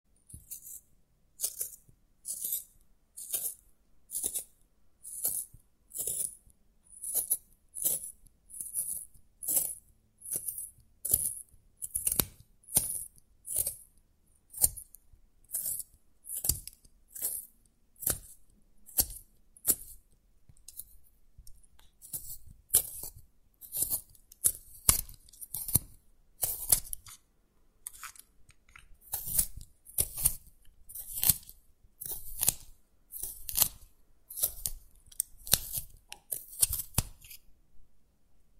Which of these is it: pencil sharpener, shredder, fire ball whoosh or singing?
pencil sharpener